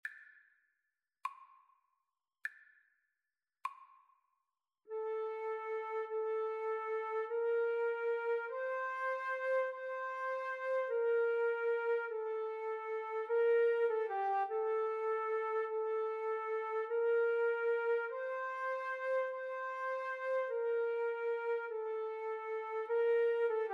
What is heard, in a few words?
Flute duet